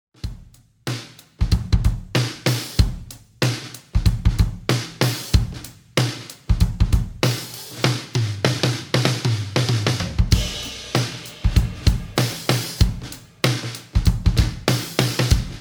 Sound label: big beat glitter lo tuning mid tempo